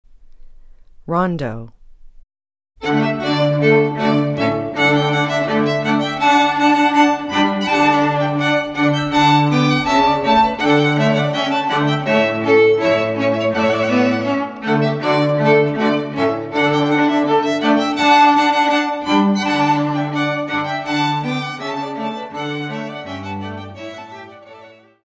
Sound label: rondeau, string quartet